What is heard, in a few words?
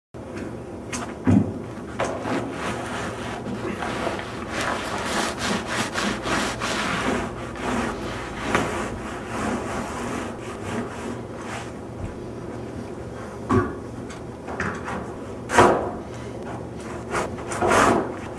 Cutter